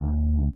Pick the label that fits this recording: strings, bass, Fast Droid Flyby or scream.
bass